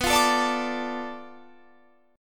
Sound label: D Minor, B; Dm/B strummed